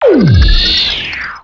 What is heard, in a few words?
Game Over